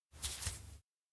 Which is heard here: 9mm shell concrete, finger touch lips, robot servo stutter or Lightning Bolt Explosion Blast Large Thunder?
finger touch lips